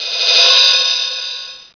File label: Open Hat Roll (Bb2); Star Trek JR Audio